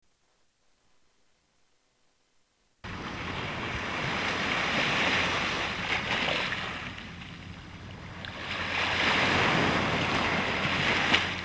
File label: Ocean